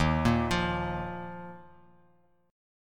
Eb Suspended 2nd, Bass Standard; arpeggiated; chord; x,6,8,10; JGuitar

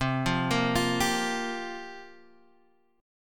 C 7th Suspended 4th, Guitar, Half Step Down

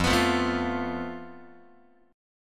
F Major Flat 5th, Guitar; strummed; 4A,4E,5A,5D,5Gsharp,6Csharp; x,1,2,3,1,4; JGuitar

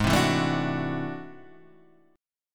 A Minor Major 13th Ab

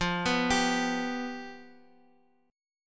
F Suspended 2nd Flat 5th, F, Guitar; Fsus2b5 arpeggiated; 4A,4E,5A,5D,5G#,6C#

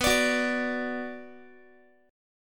B Minor B Guitar; strummed; JGuitar

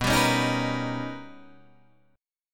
B Augmented Major 9th B Guitar Open D

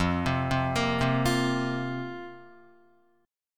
F Suspended 4th, Guitar; arpeggiated; chordshape; 0,3,0,3,0,1

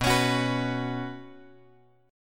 B Suspended 2nd; Bsus2 Chord {7 X X 6 7 7} strummed

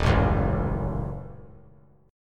D sharp Minor 7th Flat 5th D sharp, down; D#m7b5 strummed; 3C,3F,3A,3D

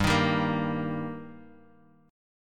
G Minor Sharp 5th G down Guitar Open D

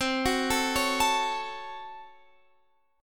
A 6th C, Chord; A6/C arpeggiated

